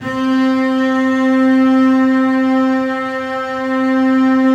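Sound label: basses sustain c4; Sonatina Symphonic Orchestra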